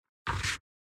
UI dumping sound

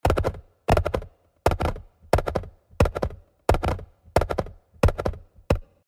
horse stiff

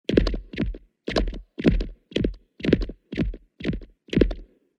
horse stone texture

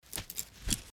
pulling arrow

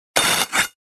RUSTY METAL

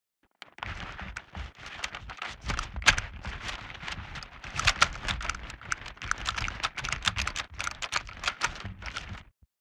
movement walk; Ranger

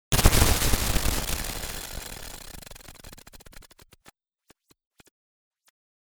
ROBOT BREAKING DOWN

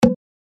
UI invalid sound

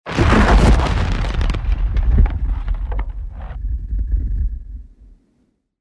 Explosion

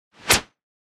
Whoosh

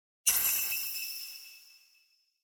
UI comform sound

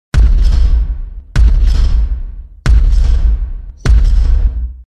Robotic footsteps

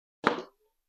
wood material

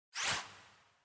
UI comform sound

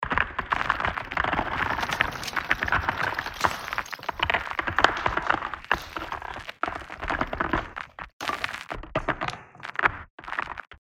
ice breaking

